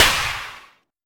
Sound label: snare; crakbone